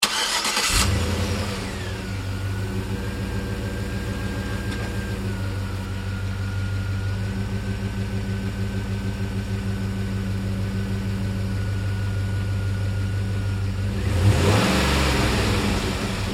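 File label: Truck GMC Savana 3 Point Turns Maneuvers Slow; Trucks; Big Room Sound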